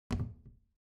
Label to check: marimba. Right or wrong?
Wrong